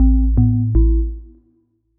Chill Club Power on, User Interface, Chill Melodic